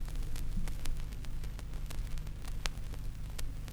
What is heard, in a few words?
Crackle, Vinyl Noise